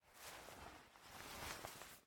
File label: Player Sit; Game rip; MiSide